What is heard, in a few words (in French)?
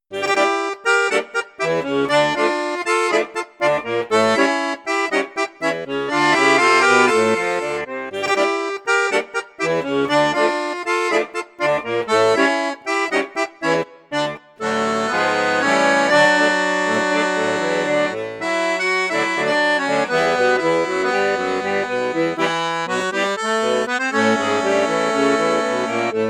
registre basson flute juste, accordéon; crucianelli